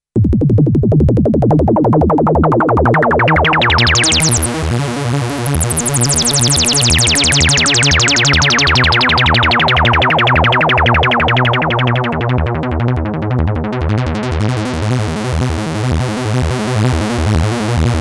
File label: Dominion X Resonance Arpeggio, synthesizer